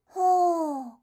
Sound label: hoo, reaction, voice